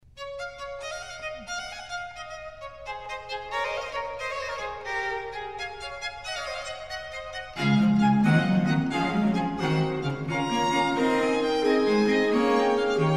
Trio Sonata Allegro; Arcangelo Corelli; Op 3 No. 2

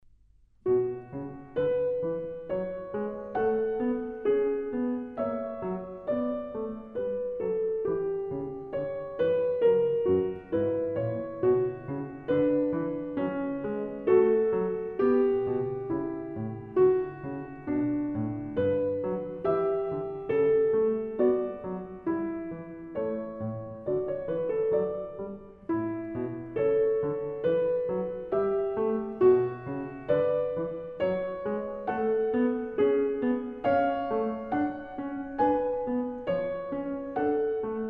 Prelude and Fugue in B Minor; bach

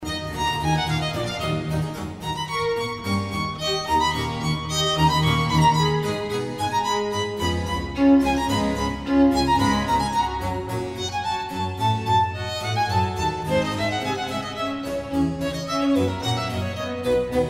Violin Concerto in A minor Allegro; Antonio Vivaldi; Op 3 No 6 from L'estro armonico

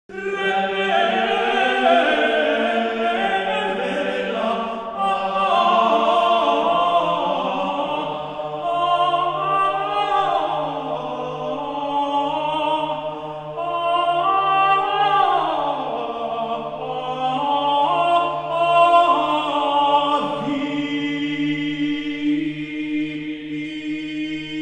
organum duplum; Leoninus and colleagues; Viderunt omnes

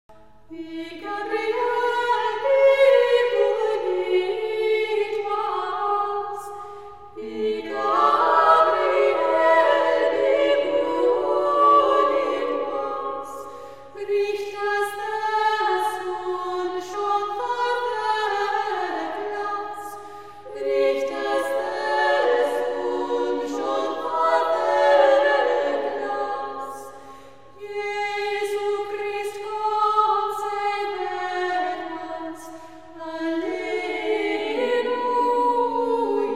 carol; Alleluia, A Nëwe Work

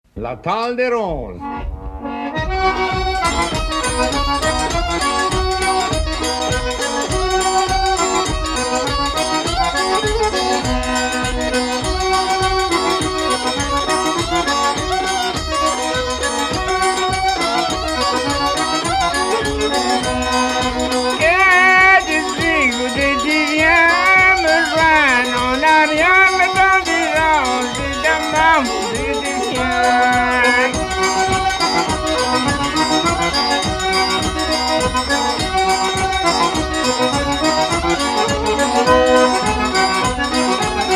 Cajun Music

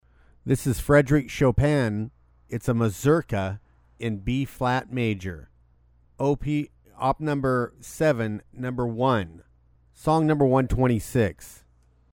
Mazurka in B-flat Major; Fryderyk Chopin; Op7No1

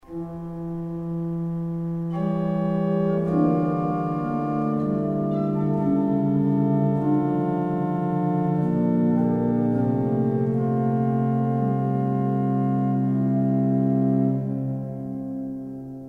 Praeludium in E Major; Dietrerich Buxtehude

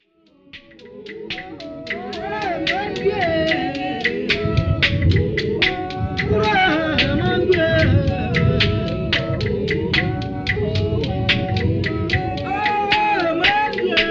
Pygmy Music

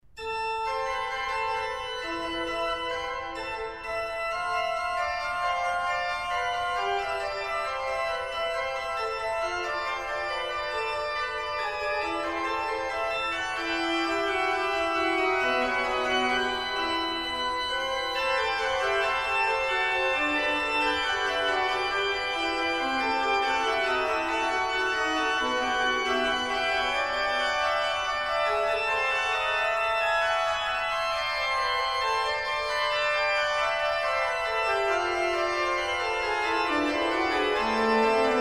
Prelude and Fugue in A minor; J. S. Bach

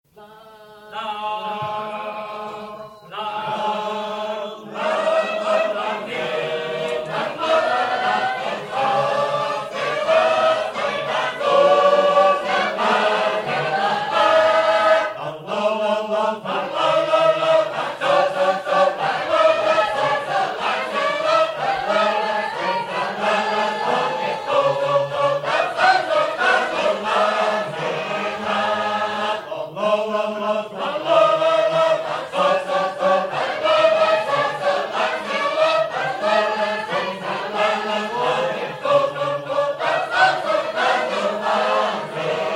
Shape-Note song

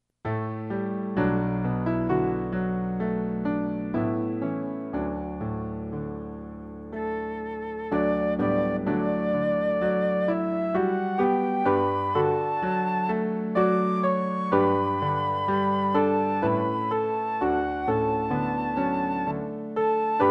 D, low, piano acc.; O Shenandoah

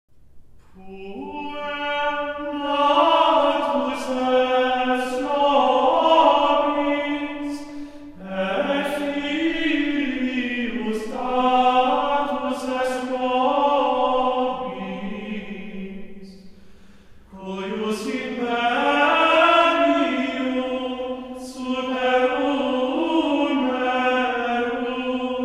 Gregorian chant Mass, Introit; Mass for Christmas Day

